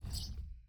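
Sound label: environment building sold whoosh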